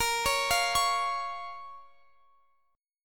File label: Bb Minor Bb down Guitar Drop D